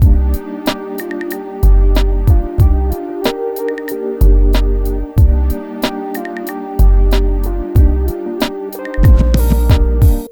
MIX, Hip Hop and R&B; Best Service ProSamples vol.02; AKAI

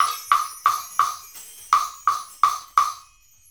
Wood Block and Chimes; Combo

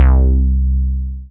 Single Electric Basses, Dance Floor Bass

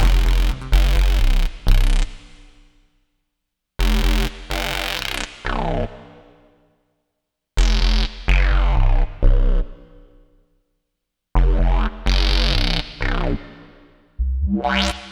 Scrubbed Clean 127 Fmin, hardcore breakbeat; Big Fish Audio

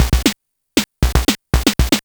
LSDJ Rock Rhythm 117BpM, Nintendo Gameboy; maurice.rebell Loops

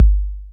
K 808, KICKS TR 9+8

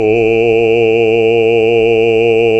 BASS, Roland; Sound & Vision - Gigapack II CD 1; GREG. BASSES

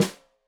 Snare Stereo, Drums; Deeply Sampled Drums; SMDrum

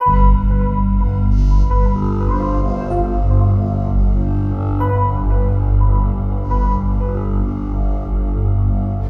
BAC, MUSICMOTIVES

